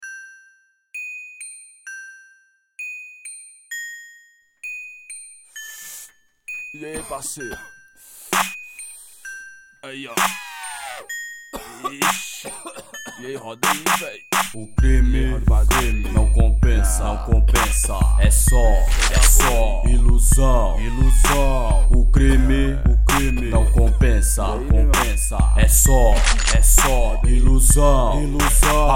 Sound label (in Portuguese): RAP dialeto periferico